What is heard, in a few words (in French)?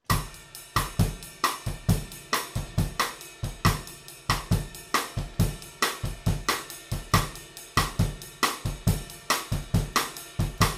rythmes incontournable, batteur